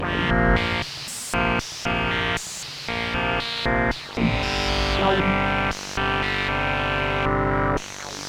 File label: Bb pulse 114